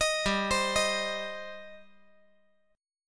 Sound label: G Suspended 4th Sharp 5th G, down